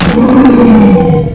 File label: fall; quake2; bauul